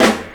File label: SD FLAM, SNARES; E-Lab; X Static Goldmine 2